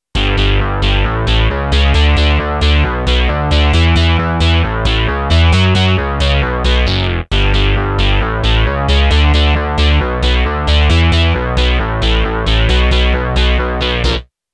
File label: Bass FX